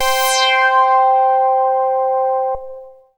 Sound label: MASS LEAD, Synth; InVision Interactive; Keith Emerson; The Most Dangerous Synth and Organ; SYNTH LAYER